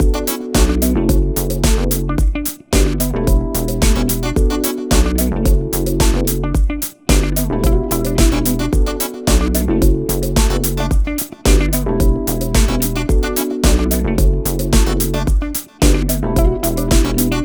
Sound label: full mix, 11bpm Bm, nu disco; Zero-G; Construction Kits; Captain Slow